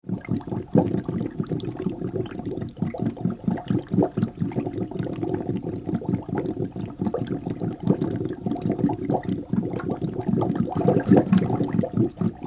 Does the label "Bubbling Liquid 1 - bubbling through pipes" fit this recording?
Yes